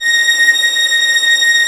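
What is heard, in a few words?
Vio legato A#5; Digital Sound Factory Virtuoso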